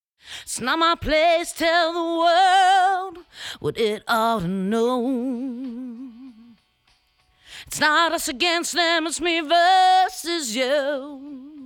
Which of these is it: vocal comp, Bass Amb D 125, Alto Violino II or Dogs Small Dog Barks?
vocal comp